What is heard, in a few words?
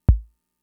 Kick, Drum Machines Fricke MFB-522